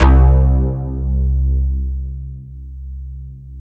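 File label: synthesizer, digital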